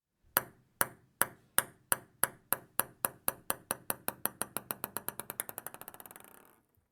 ping pong ball bounce

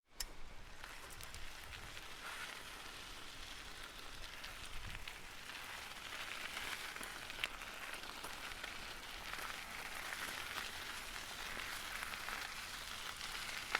bicycle riding on gravel

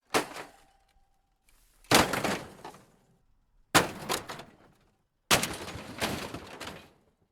small crash series

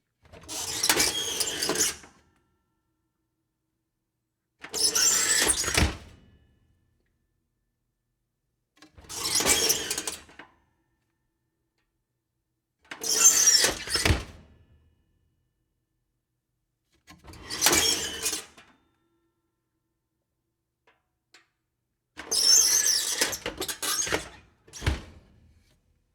creaky oven door open

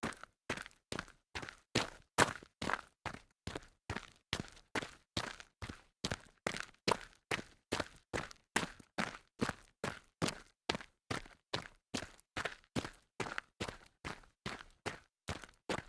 walk fast on gravel series

